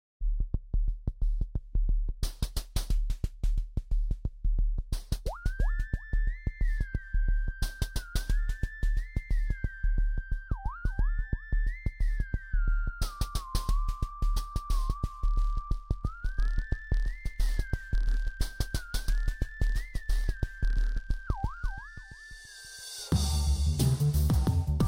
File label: music dance